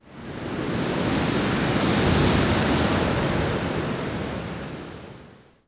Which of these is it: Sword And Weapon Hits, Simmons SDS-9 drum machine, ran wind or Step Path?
ran wind